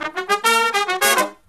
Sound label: Brass Riffs; USB Soundscan vol.29; AKAI